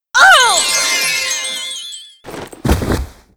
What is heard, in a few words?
Windy Witch Die